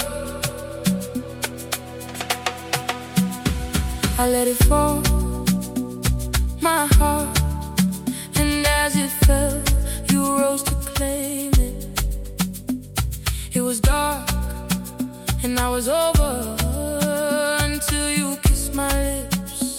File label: Afro Soul Fusion